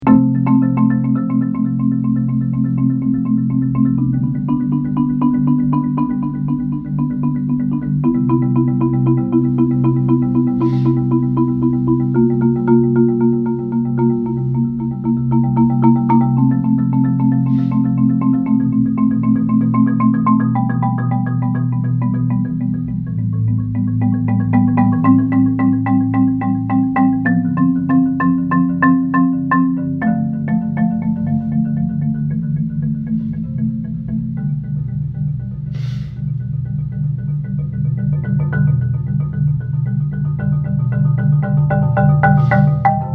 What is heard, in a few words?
marimba; improv: Marimba